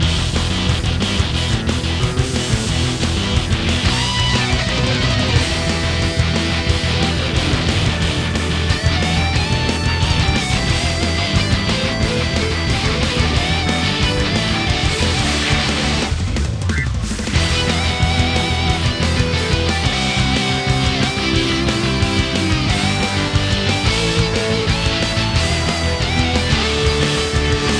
Battle Loop

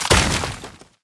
Ice Break

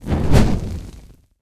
Ambient; unreal development kit

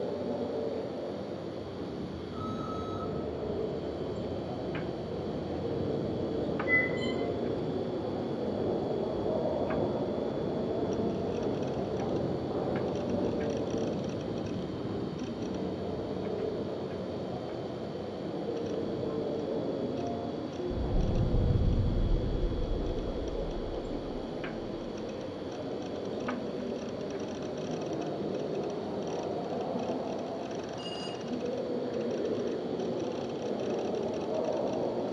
Amb Cabinet; Beholder 2

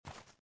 run grass

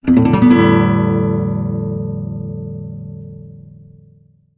Guitar Strum; serious sam siberian mayhem 2022 pc gamerip; Easter Egg

